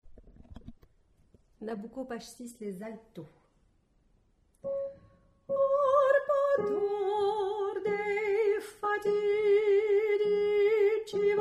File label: Alto; nabucco